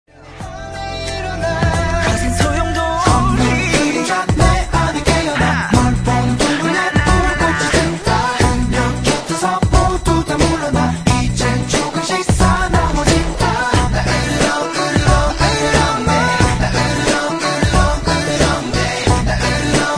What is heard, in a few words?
korean chorus